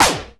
Hyper Snare, Protean Drums; Multisamples; Digital Sound Factory

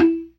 PERC, ORGAN; Keith Emerson Signature Series

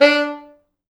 D3, Pop Brass; Best Service ProSamples vol.19; AKAI

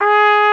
warm trumpet G#3; InVision Interactive; Lightware VOL-1; Instruments & Percussions

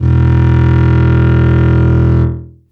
Synth Bass E; Sonic Foundry (Sony Creative Software); Ugly Remnants VOL-2; Basstuff

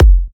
F, kicks; markus hakala kicks

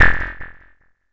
Arp Echo D

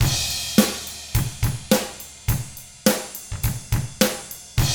105 POP RK, Drums; ILIO; Double Platinum Drums 1

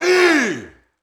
BRAZIL, World Voices; ILIO; Vocal Planet VOL-5; RIO MADMEN

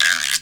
guiro long, Yamaha Motif ES drum machine; 300 Drum Machines; Yamaha Motif ES-Series

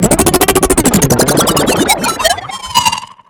BASS SHOT; SCREWFACE

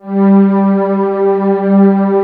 WS WARM ST; Greytsounds VOL-1

